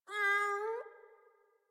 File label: Kitten Meow Playful; Enemies; Warframe; Catbrow